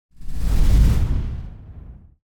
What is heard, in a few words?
Whoosh High Energy Sub; Hollywood Edge; sound designer's toolkit v2